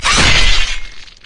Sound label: yasuo base basic attack; LoL